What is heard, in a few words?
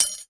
impact metal small; sound effects one